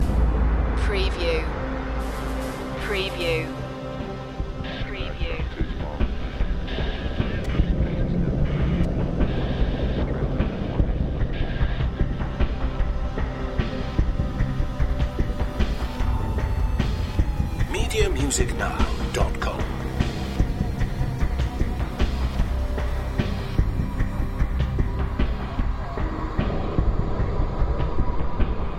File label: distorted fragments loop